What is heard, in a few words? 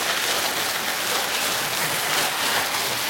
slide loop